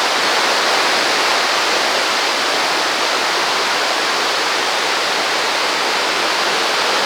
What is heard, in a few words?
Enviornmental; Sonic Foundry (Sony Creative Software); Ugly Remnants VOL-2; UR 2 FX (Long)